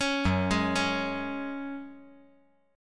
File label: Gb Suspended 2nd, 5G,4C,5E,5A